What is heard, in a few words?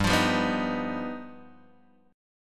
G Augmented 7th, Guitar Open G